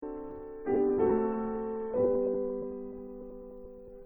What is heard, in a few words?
piano test